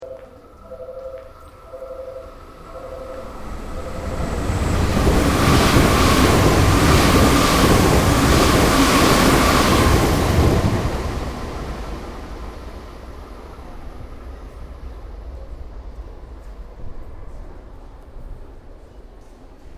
Train JR Shinkansen N700 Japan Exterior Speed Pass; Japanese Trains; Vehicles